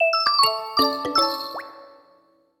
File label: Menu Banner